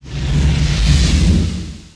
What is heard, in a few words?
Long Run Flame; Strategy Challenges Collection